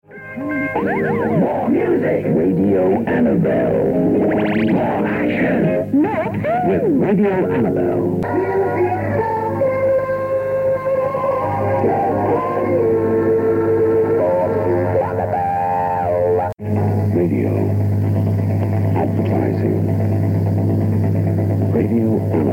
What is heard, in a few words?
jingles; Radio Annabel